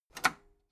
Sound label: metal lever button radio disable medium